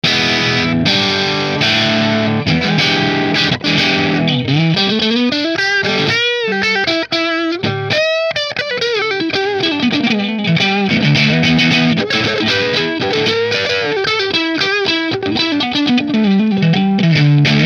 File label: Nola Model 8 Neck Middle Marshall, guitars